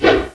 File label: swoosh big; Ferazel's Wand Demo